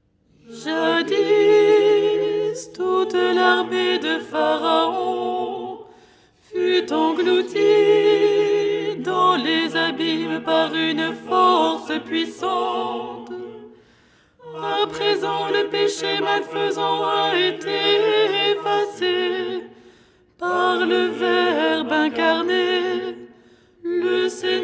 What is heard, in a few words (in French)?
alto